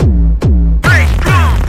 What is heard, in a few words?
bbombbom bass drum, audioloops